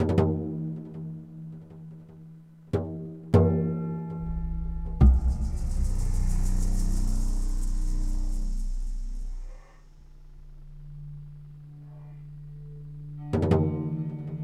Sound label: Amb Indoor; The Hobbit; PS2